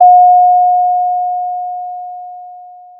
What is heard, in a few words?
Sound of a doorbell